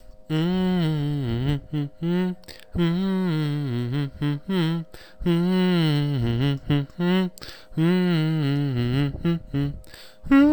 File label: mystery song